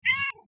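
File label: cat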